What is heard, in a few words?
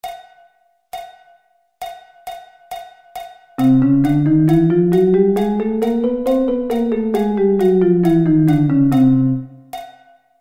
Chromatic Scale